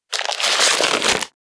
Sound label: Paper unfold